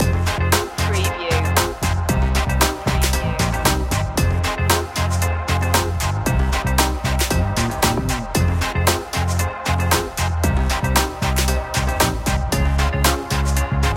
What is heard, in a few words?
cool style loop